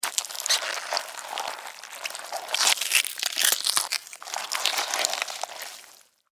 Spider Bite Suck, Spider, Bite, Suck, Blood, Eat, Juicy, Wet, Arachnid, Insect, Bug; Sound Effects:Animals:Insects; Spiders; Blastwave